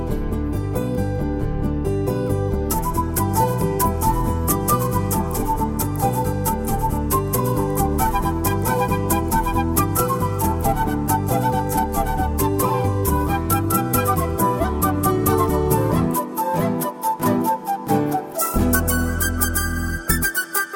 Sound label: BGM Telugu Ringtone